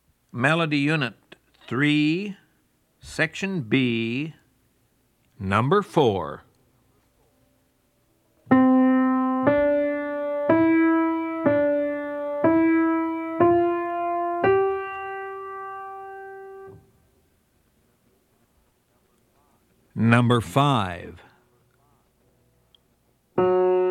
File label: melody, ear training recordings